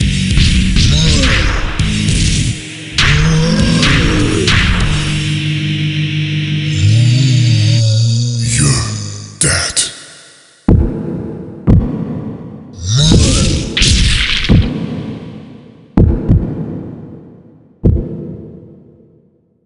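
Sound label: Game Over Circus; Undead Bowling; Zomgeri Bowling; 3DS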